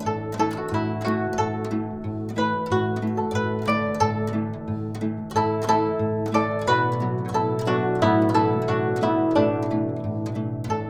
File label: GUCHIN 90 D; INIS - Opium